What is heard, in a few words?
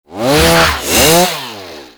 Lunge Attack, Attacks; Warframe; Grineer Ghouls; Ghoul Sawman